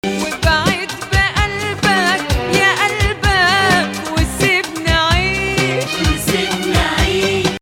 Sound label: Hijazkar Octave; Hijazkar 8